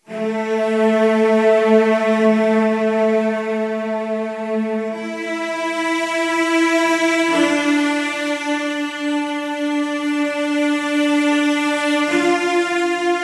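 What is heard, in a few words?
Celli D minor 100BPM; Construction Kits; STEMS; Ghosthack; SkyRealm; Kit 17 - Forgotten Glory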